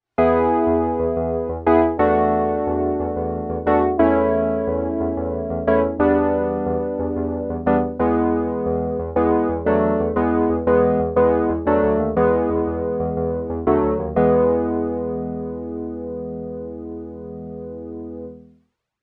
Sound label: Electric Piano II